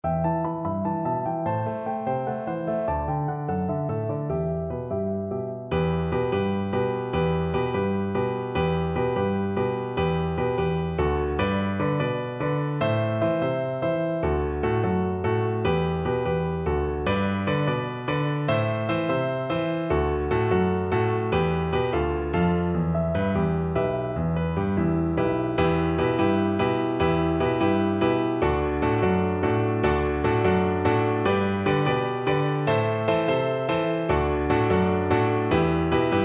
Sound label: Oboe